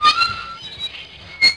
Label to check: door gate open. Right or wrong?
Right